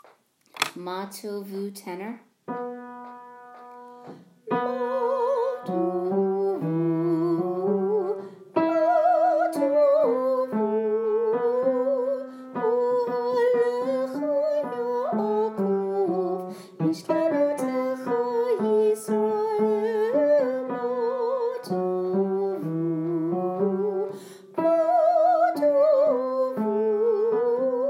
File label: Tenor; High Holidays; Mah tovu 2019